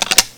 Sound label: bolt back, weapons